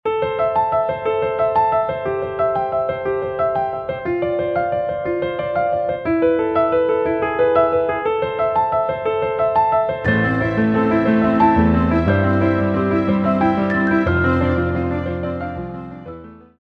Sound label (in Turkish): santral fon muzikleri